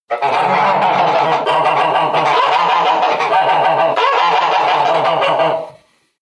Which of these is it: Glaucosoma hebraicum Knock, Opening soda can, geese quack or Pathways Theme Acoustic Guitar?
geese quack